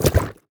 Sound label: Object Wet Dip